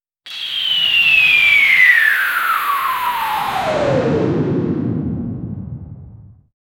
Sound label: a cgi banner drops from